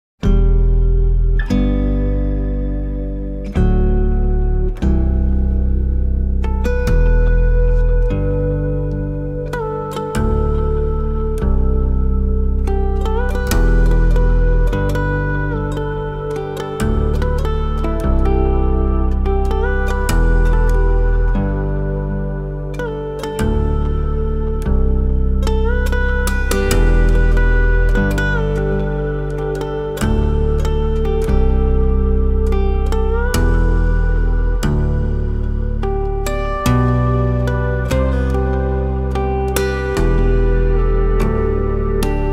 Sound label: fingerstyle cover